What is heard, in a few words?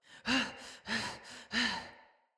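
Cry Heavy Run Female; Battle Barks; Envoy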